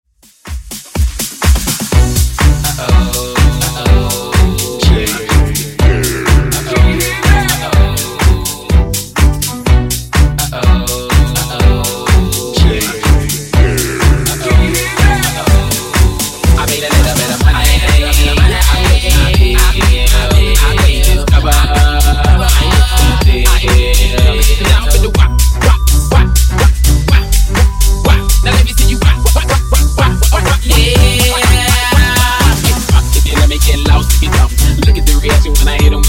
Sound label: Funk 124 Bpm